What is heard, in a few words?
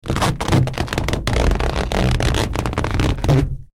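long strained drawn-out creak of a rubber balloon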